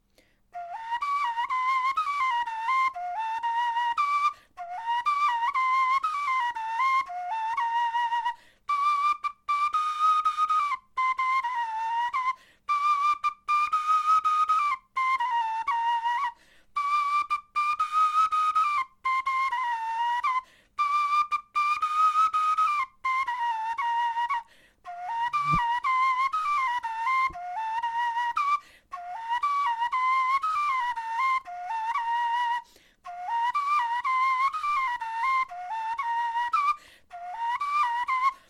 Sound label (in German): melodie